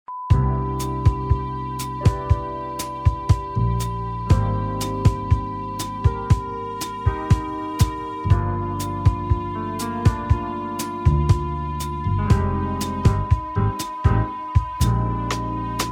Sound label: smooth melody bossa